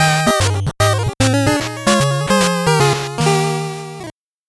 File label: 8bit jingle slow